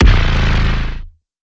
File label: Hit Tonal Robotic Bass